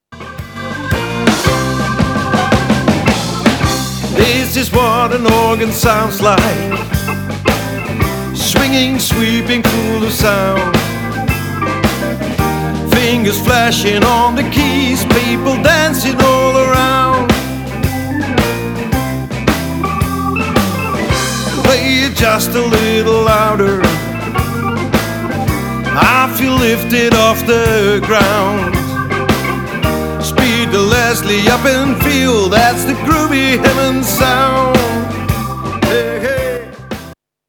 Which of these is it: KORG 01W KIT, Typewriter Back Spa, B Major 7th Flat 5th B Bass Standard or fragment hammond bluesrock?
fragment hammond bluesrock